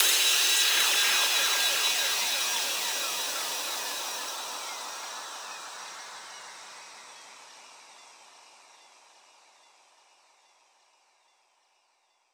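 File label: impact chimey, downfall sfx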